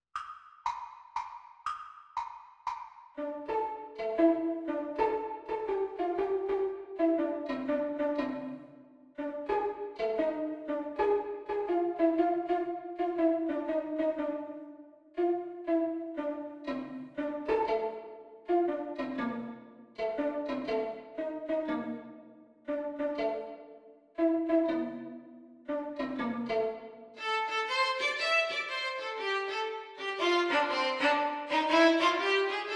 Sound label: Violin Duet Getting Around; Exam Speed